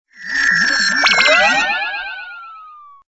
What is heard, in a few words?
head shrink only; Toontown Online